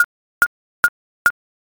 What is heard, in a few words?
thinking time